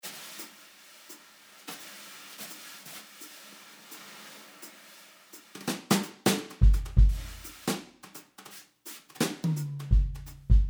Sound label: pop brushes, drums; gorangrooves; handy drums